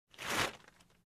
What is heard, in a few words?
Aircraft First Class Curtain Roll